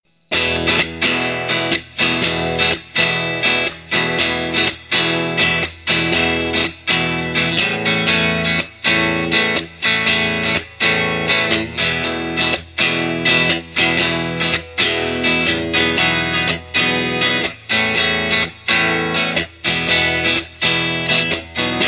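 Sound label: Lightning Lead Clean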